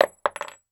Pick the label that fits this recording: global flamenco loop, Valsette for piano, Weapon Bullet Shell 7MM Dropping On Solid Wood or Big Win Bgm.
Weapon Bullet Shell 7MM Dropping On Solid Wood